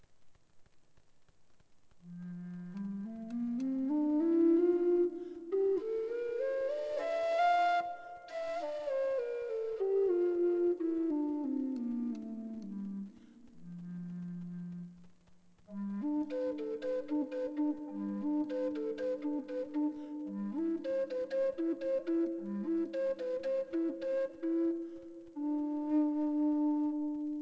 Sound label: bass, flute baroque